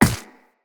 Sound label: Run Ice, Footsteps; Characters; Operator